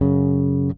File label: Fifth One Shot F#C#, Bass; Bass X